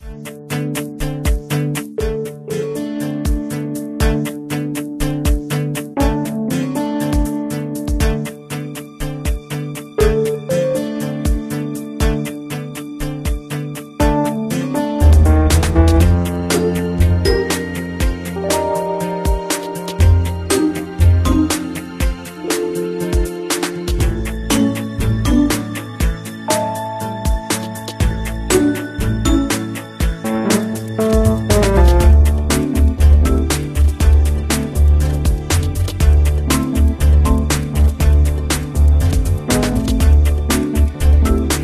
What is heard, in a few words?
Four Seasons Background; Pixel Gun 3D